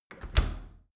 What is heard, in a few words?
dry cleaner close soft; maschinen